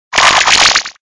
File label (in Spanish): Grito de Pincurchin